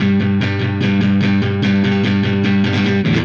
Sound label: Silos Dirty Strum Guitar, Indie Rock